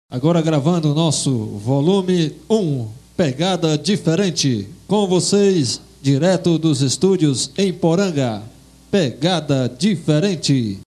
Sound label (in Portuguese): abertura